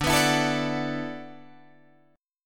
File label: D 6th Add 9th D, Guitar, Drop D